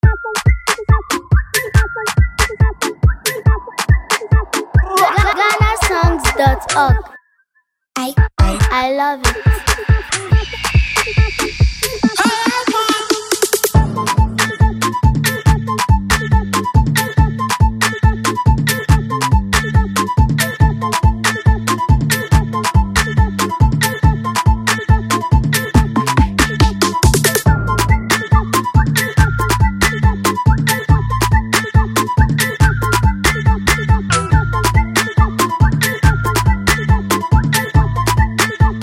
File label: Afro Beatz Free Beat